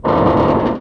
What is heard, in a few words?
metal box strain; physics